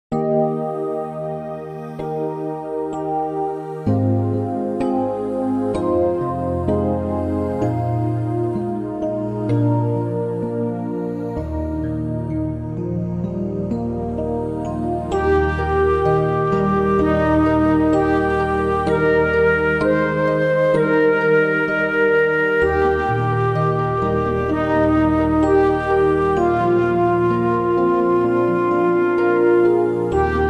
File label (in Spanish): Organos; Organos I; Danos tu santo pan